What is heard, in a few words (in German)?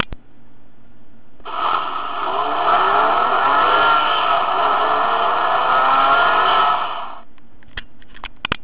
beschleunigen, astra; Gasgeben; Soundlenkrad; Astra XS; BobbyCar; 20er Soundlenkrad; Pimp my BobbyCar